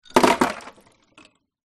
drink liquid and ice cubes pour from glass into metal sink